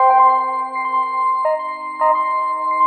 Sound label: Synth Nuage; Sonic Foundry (Sony Creative Software); Knutrix Drum & Bass